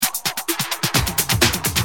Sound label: Tight, Drums Perc; Industrial